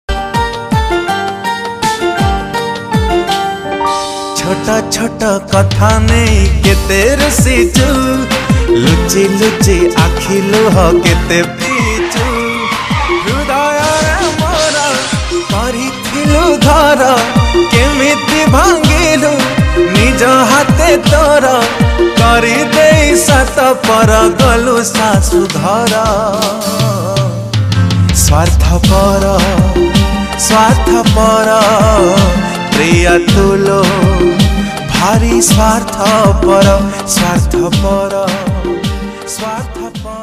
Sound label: Odia Song Ringtone